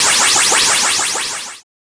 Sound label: ROCK